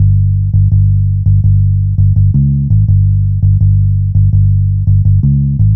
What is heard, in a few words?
Long Bass, Bass Lines 166.5; Jungle Warfare 1&2